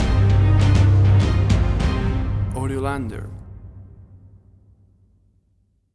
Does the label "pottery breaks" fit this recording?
No